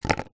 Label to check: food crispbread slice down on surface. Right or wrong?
Right